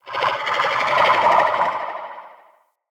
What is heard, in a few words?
Corrupted Slug Vocal Attack